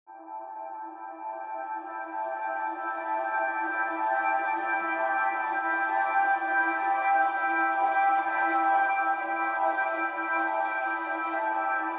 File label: ambient